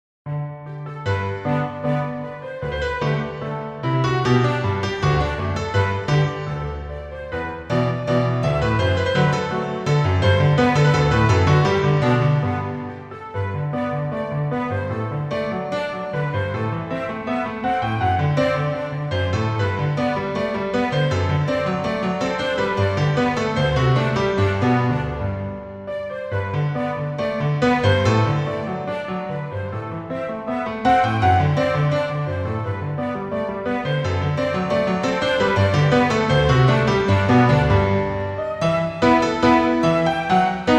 Orchestral Version; MLPMA

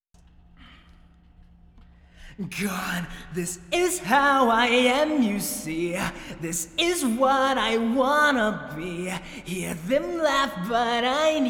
Verse 3 Vocal

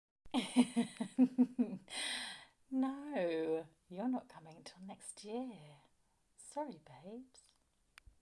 my laugh

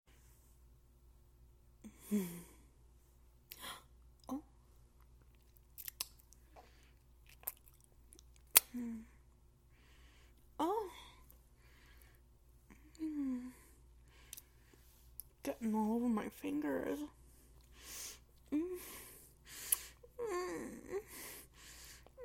Moans Whimpers Licking Sucking, Erotic audio; F4A; One Daddy mention; Giggles

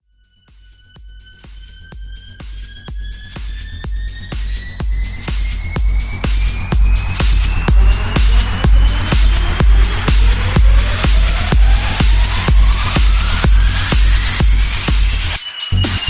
Random Techno

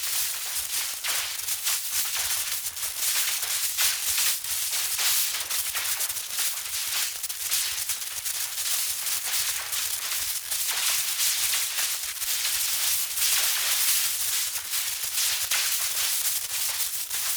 Monster; Zenless Zone Zero

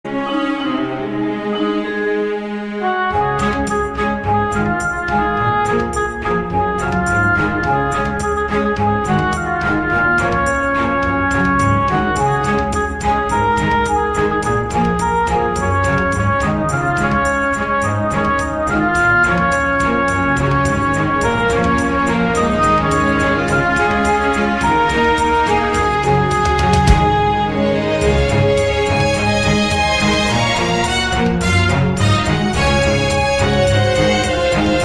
Orchestral Remix

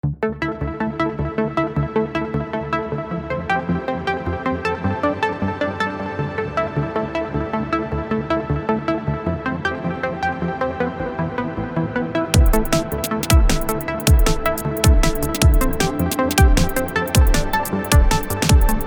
dreamy plucks

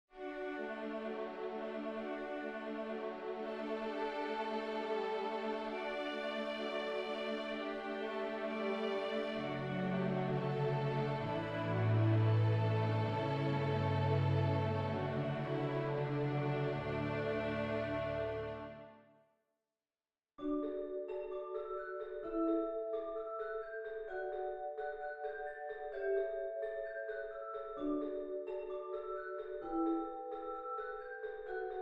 Orchestral Arrangement

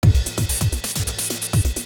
drum; Pony Fan Music Remix Stems

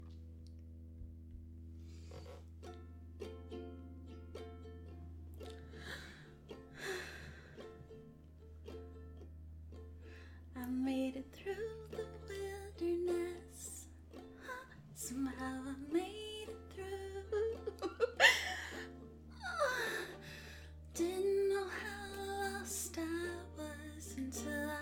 Hysterical Ukulele

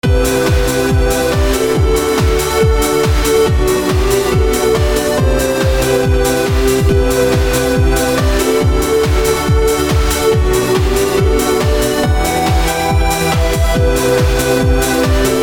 uplifting trance piece

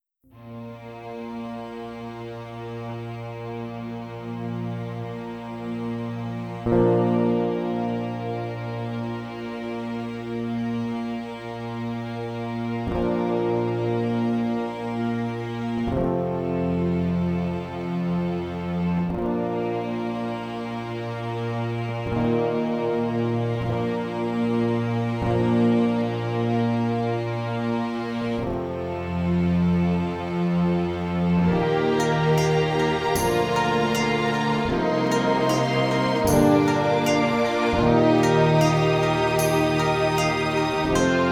ORCHESTRA BACKING; 154BPM

